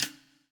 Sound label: POWER RIM